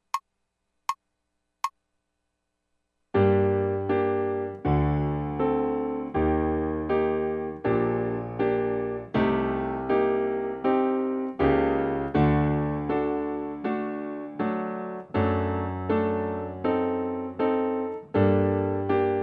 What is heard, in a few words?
Eccles Sonata Mvt 1 ACCOMP, saxophone